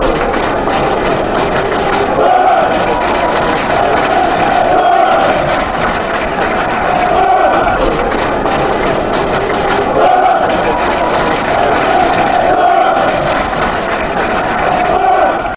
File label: clap posh, fanchants; boro